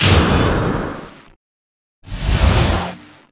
cross examination

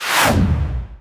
point whoosh

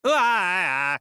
whaaa; monodam

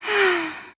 sigh; tokoae

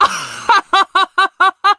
laugh

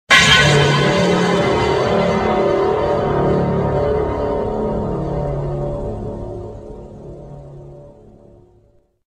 alert

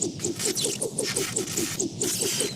woozy

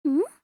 hm; aya

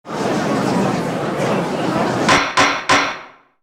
gallery gavel x3

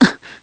"ungh"; tigris

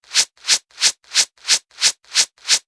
knit

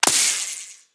watch; bobby

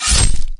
impale

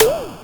enemy hit; sonic

